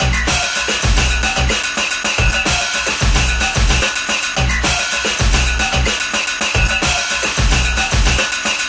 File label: 110 tribal crazy brazil; 101 to 110 bpm